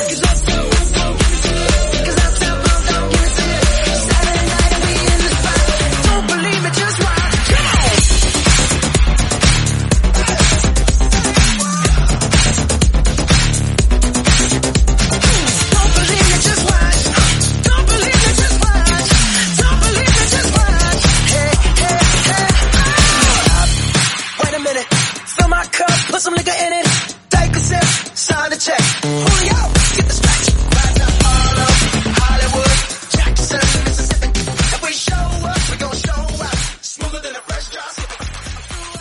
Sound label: 124bpm G